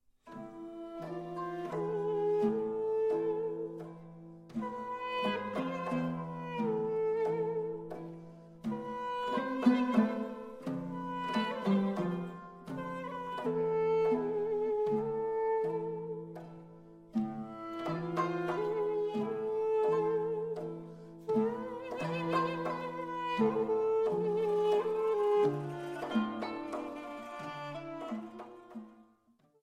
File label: Armenian Song Instrumental